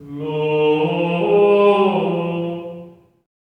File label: GR ALLELU, Symphony of Voices; Spectrasonics